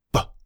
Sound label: Grim Sound